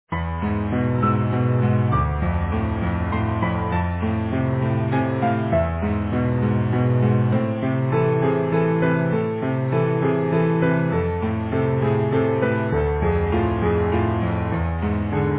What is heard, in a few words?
Easy Piano